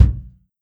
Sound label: Kick